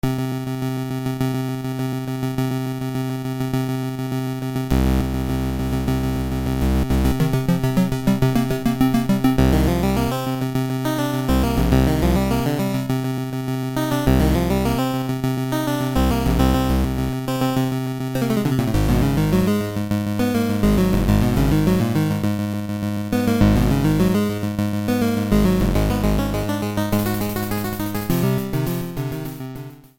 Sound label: Rocket Run; DKL3 OST